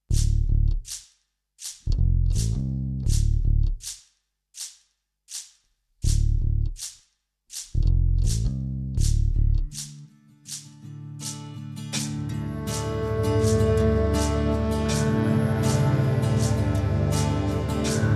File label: Instrumental; Kokki 2006